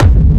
Techno Kick; Techno Kicks; Ghosthack

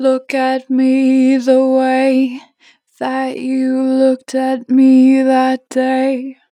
Look at me, 4 bar vib, Vocals, Natural Vibrato; Harmor Vocals

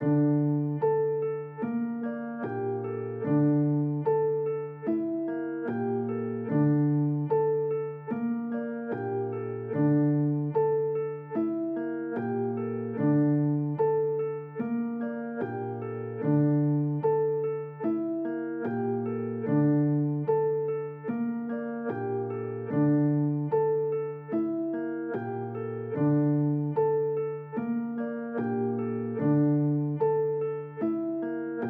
148 BPM D Min Piano; Loop Stems; Cymatics; Audemars

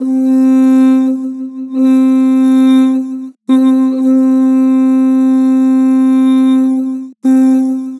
Ooh, Vocals; Harmor

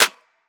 Clap, Hip Hop Drums - One Shots; Cymatics - 9God Hip Hop Sample Pack

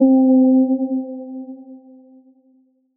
KEYS Mythic (C), Melodic One Shots; Cymatics; ANALOG EVOLUTION Beta

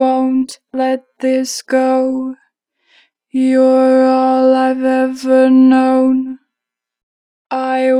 Vocals, I wont let this go, 4 bar flat; Harmor Vocals; Pitch Flat

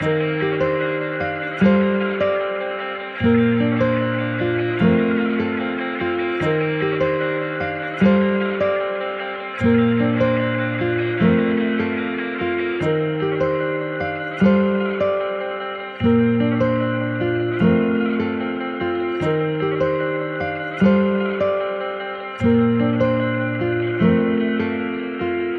150 BPM G Min, Guitar; Cymatics; Miami Guitar Pack - Pt 1

